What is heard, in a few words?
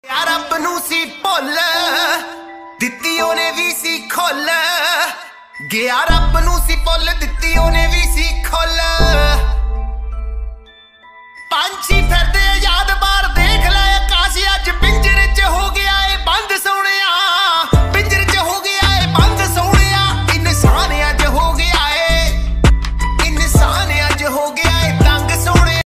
DJ Punjabi Ringtone